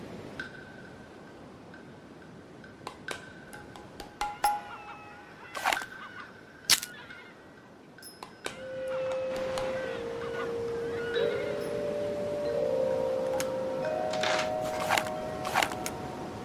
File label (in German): klick and melodie sounds; return to mysterious island